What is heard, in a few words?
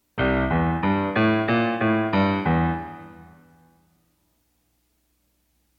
melody recognition